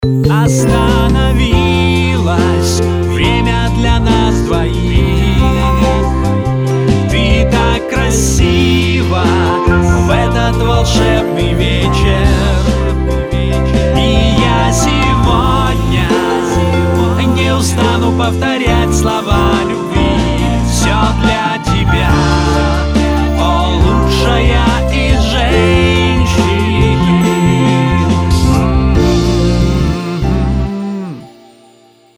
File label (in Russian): vokal